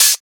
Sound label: Open Hat; FLPRO